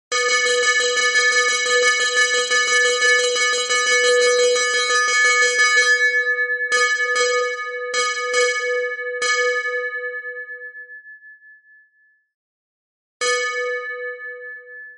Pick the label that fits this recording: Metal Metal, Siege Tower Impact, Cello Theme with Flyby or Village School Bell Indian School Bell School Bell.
Village School Bell Indian School Bell School Bell